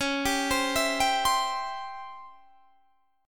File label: Db Minor Major 7th Flat 5th Db down Guitar Standard; DbmM7b5 arpeggiated; JGuitar